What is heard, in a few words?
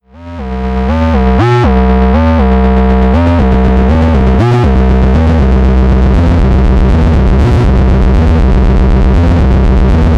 noisy interlude long fadeout